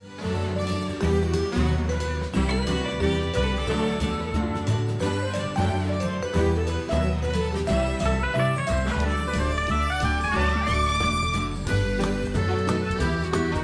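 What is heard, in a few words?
instrumental version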